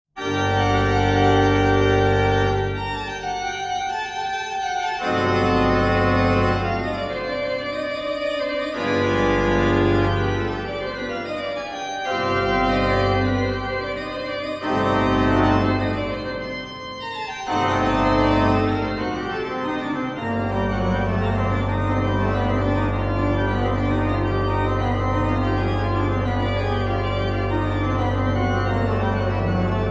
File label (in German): Fantasie und Fuge g-moll BWV 542